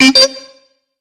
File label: beep